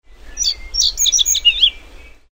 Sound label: whinchat